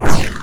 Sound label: pain; player